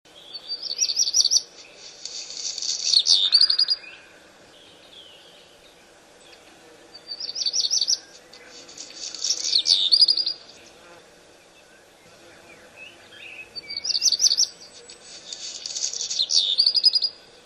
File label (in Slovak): zltochvost, zvuky vtakov